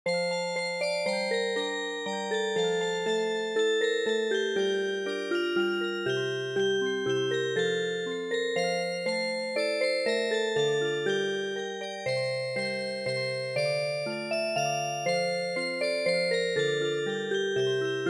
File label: Music Box, midi